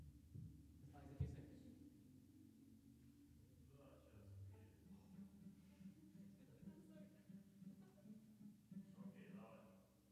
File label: Piano